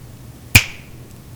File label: finger snap